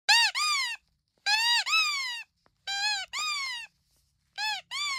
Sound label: Squeaker Foam Size 8, foam squeaker insert for soft toys